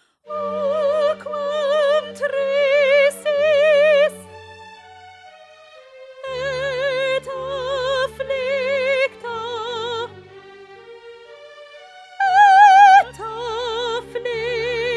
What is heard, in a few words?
Soprano; Pergolesi; Stabat Mater